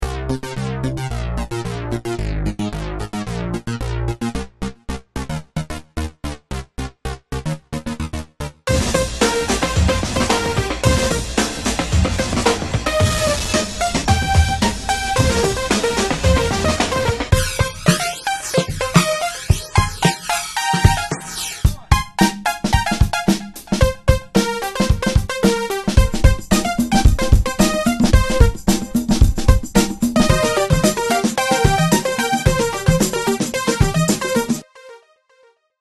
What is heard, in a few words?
background Music